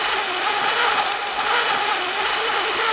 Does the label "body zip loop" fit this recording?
Yes